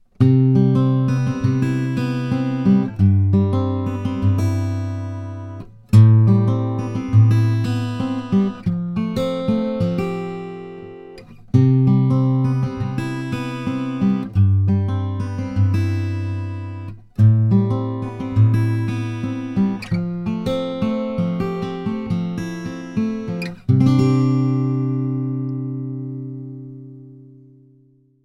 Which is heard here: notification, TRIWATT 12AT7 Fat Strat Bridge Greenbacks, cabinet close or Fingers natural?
Fingers natural